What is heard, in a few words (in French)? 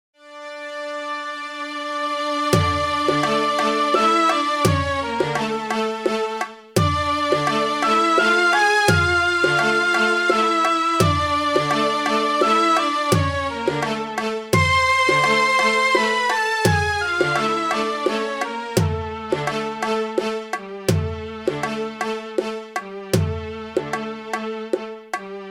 indicatif 2e partie